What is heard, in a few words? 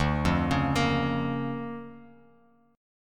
D Minor Major 7th Sharp 5th down Bass Standard, chord; DmM7#5 arpeggiated; JGuitar